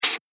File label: Pencil On Paper; Writing Sound Effects; Foley Sound Effect; Andrew Fleming